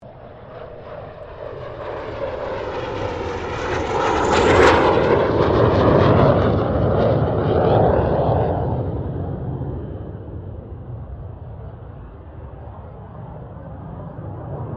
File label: AIRCRAFT CA-27 SABRE PASS STEREO